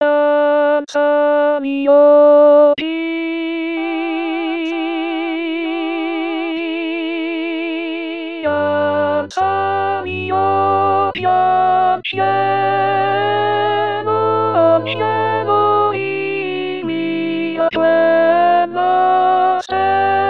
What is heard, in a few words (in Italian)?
contralto in rilievo